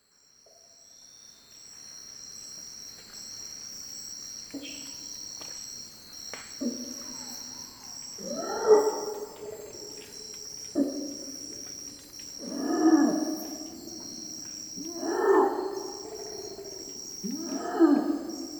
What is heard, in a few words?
orangutan long call